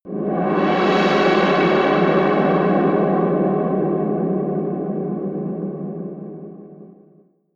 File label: reverby spacy scrapes, Hits/Impacts; whooshes rises